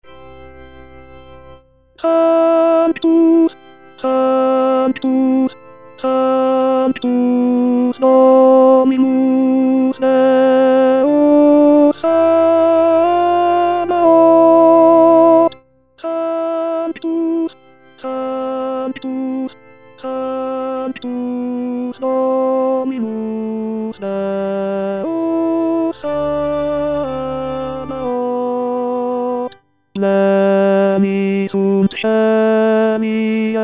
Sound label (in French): tenor sanctus messe, chapelles; gounod